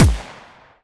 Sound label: pistol fire; weapons; Half Life Alyx